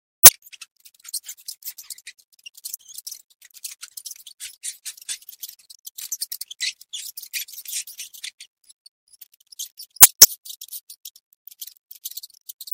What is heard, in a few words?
mouse sound effect